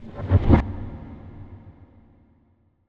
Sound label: Fx Reverse Transition; MatiasMacSD; INFILTRATION